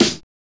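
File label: HSE-SD, house snare drum; X-Static Goldmine 1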